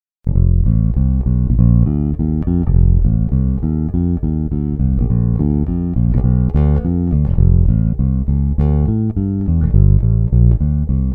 Walking finger, pbhumbucker

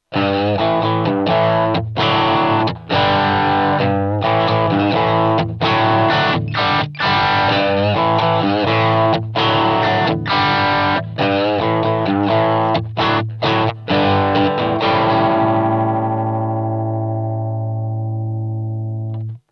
tele drive bridge

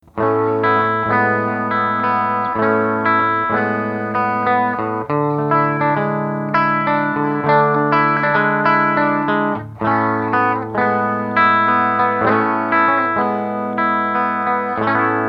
Bridge Clean

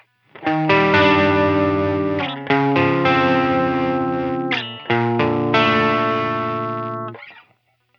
Breakup, Fender Twin Reverb; EQD Plumes

